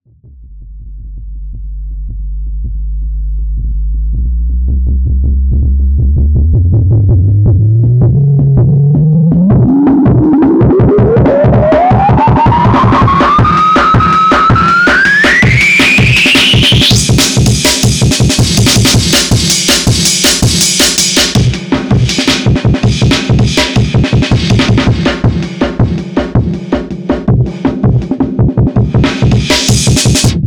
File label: drums